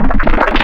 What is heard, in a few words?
Talk, Wavetables; Antidote Advent